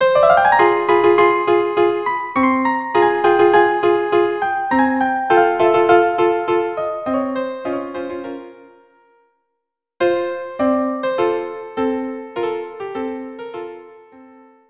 song violin duo; Toreador

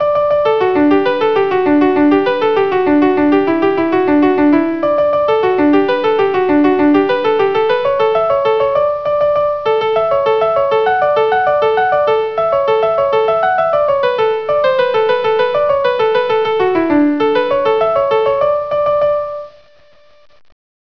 Hornpipe

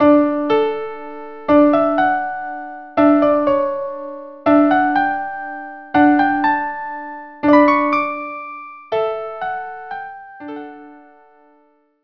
Musette; Handel

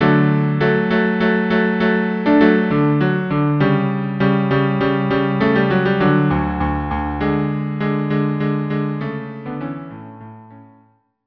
Minuet cello duo; Don Giovanni

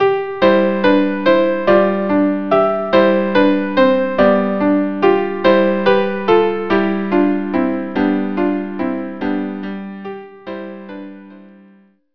tempo di menuetto

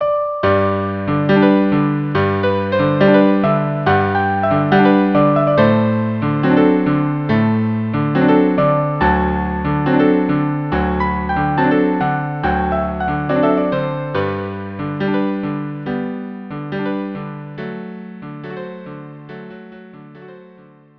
quartet

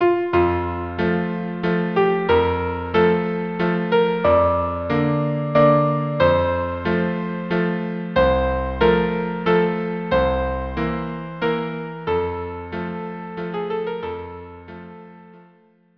viola cello; Un di felice; La Traviata

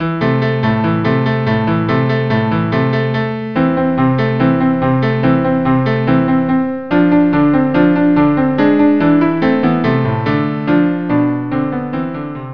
cello cello

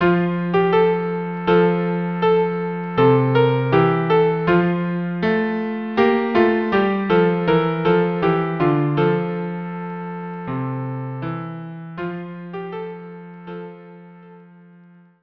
violin cello, traditional Jewish wedding song; Instrumentation: Violin and Cello; Mi Bon Siach (violin cello duo)

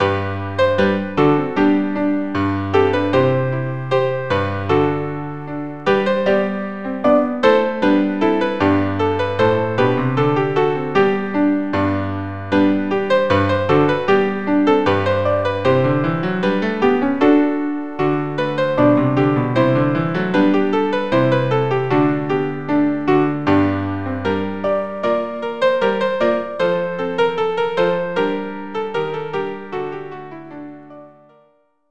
Ballet SQ4; Terpsichore

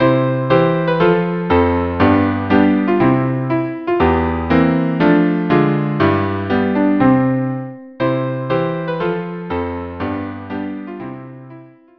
trio

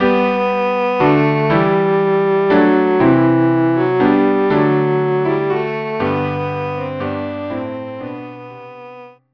galliarde cello piano; cello and piano or organ; frog galliarde

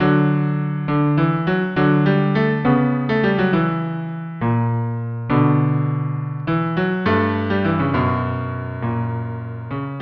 cello duo; Rossini; 5 duos

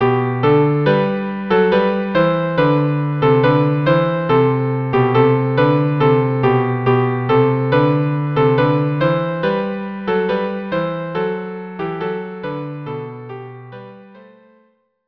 viola viola, viola cello duo; La Bergera

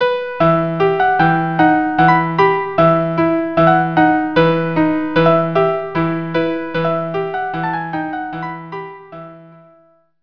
violin viola

